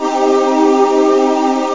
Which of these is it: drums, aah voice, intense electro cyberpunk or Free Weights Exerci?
aah voice